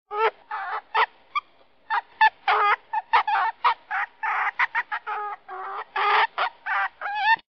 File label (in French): Furet, notification